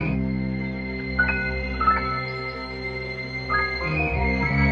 piano strings grime, sad; Loops

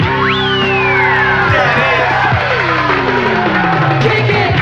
keys, phonky loops

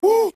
"woo", nongender; ooh ahh; ho hoo woo

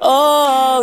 singer, stabs

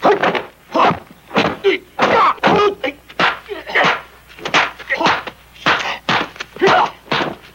martial arts

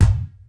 Kicks Medium

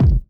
quebec, Kicks Medium

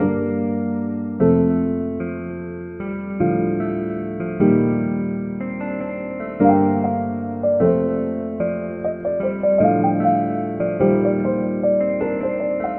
piano wild funk, Sad; Loops

